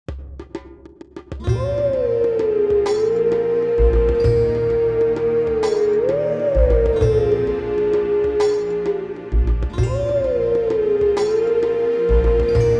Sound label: Howling Stone - (Duo); Legend of Zelda, The : Twilight Princess